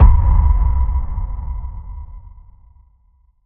Strangeness Desolate Boom

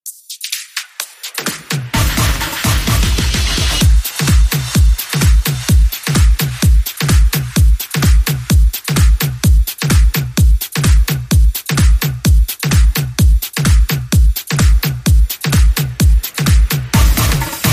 Spanish Club Beat

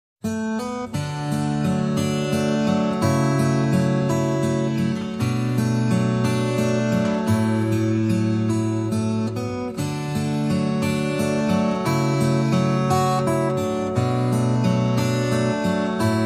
Guitar Only

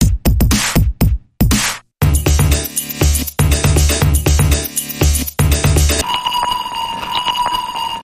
Dance Bumper Reversed

